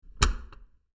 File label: Locked Metal Door Handle Short; Door Closing Sound Effects; Door Closing (Sound Effects:Doors)